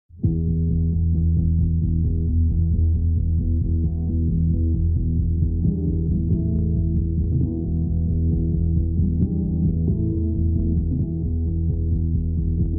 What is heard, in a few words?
rhythm